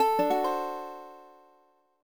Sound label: Guitar Strum; the legend of zelda phantom hourglass; Freedle